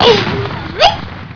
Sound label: jump; Alien Arena; drfreak